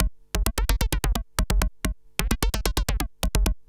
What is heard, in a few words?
Beats, Industrial